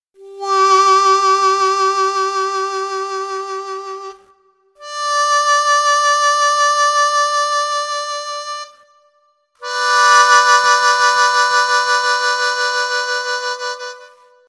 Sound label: Effects, harmonica